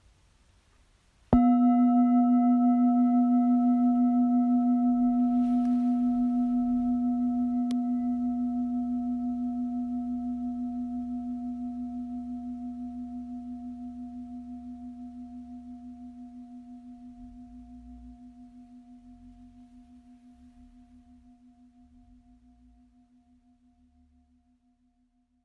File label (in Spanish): Campana Tibetana